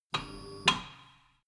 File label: tire changing machine turning platform short